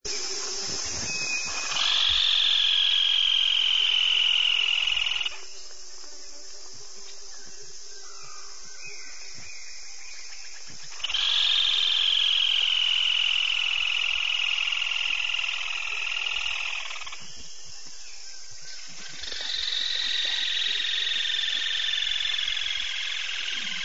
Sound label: rufous sided crake family party; Peru